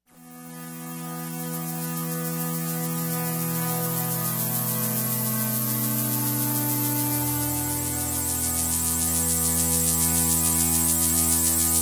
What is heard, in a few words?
Wind Time